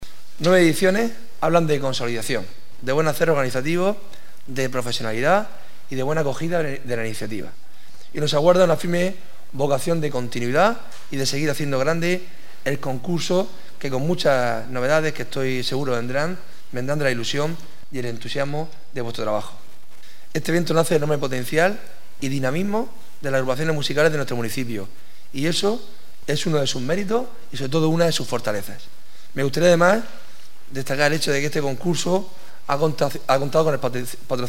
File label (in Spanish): canto; IX concurso jovenes interpretes